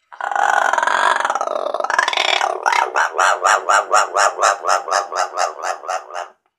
Macaw Caws. Several Low-pitched Bird Caws. Close Perspective.